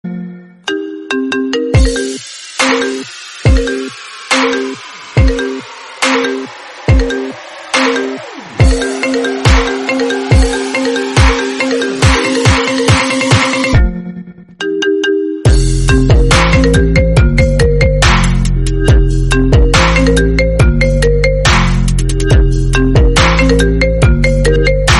apple remix trap

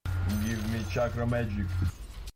chakra magic

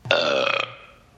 burp

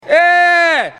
eeeee

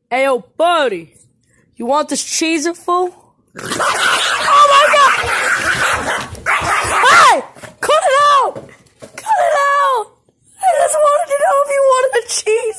dogs fight over cheez its

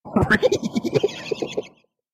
laugh sped up; scooby doo

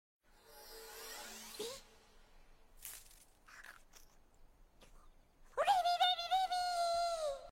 cute reaction after tasting a cherry

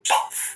goldfish puffs puff

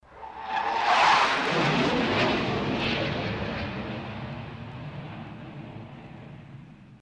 jet fly by

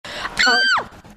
shriekk

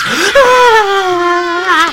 vash scream

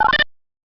button; half-life

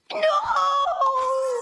noooooo; bobby hill

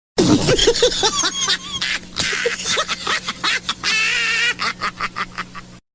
burst laugh

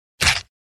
stab

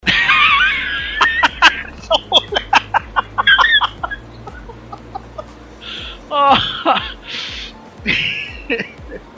laughing cupcake

